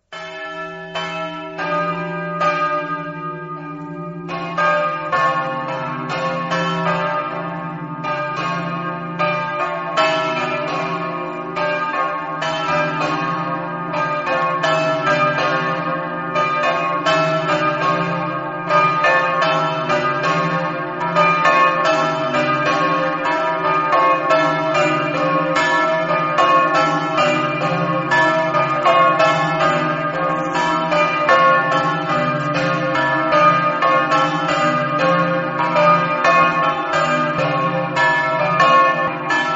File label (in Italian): campane; iconicco